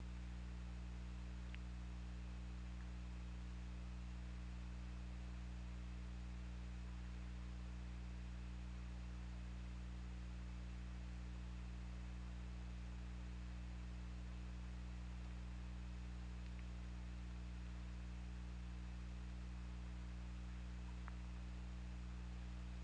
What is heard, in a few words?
Orca; OrcaLab